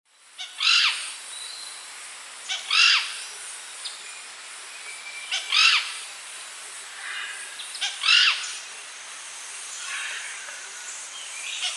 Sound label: Piaya melanogaster, calls; S. America; Eastern Lowlands